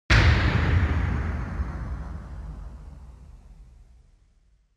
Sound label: Impact Low; Onoma